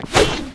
FROZENQUEEN ATTACK; Eft

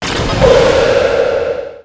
Magic Drain Fire; Eft

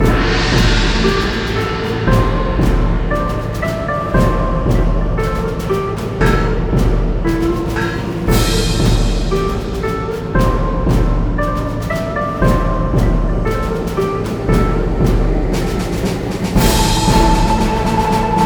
Music Ancient Tomb

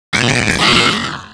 GREATWALLMAGICIAN ATTACK; Eft

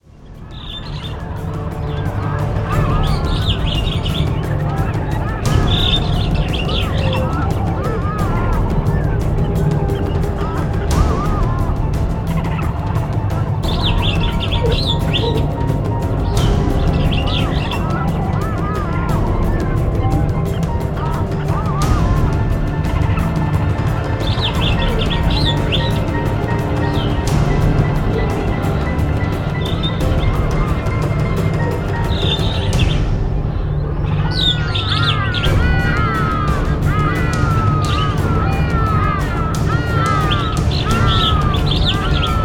Music Lost World

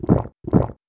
Use Heal

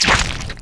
Crab Die

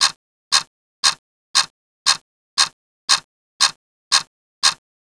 clock half

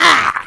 MONKEY BOXER hit

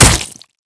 Weapon arrow Hit

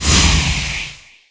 Magic Ice

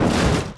Mortar Die

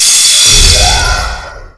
Magic Laser Beam

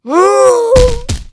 JAPANHEALER die; Eft

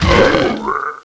bossmonkey die; Eft

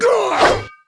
Butcher attack

